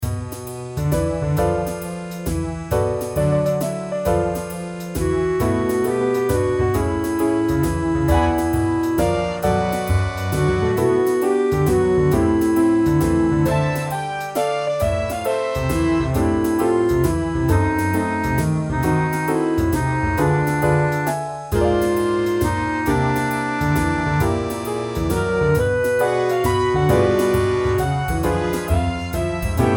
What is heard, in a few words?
jazz